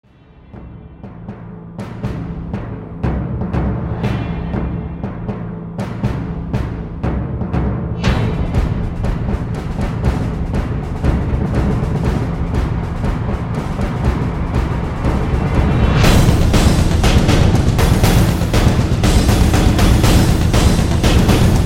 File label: drums